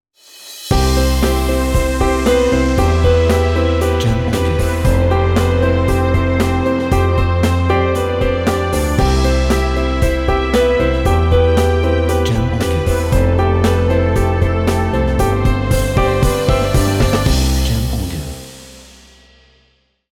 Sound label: Motivational Intro